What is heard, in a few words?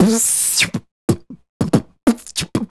Beat Box, Hip-Hop and RnB Vocals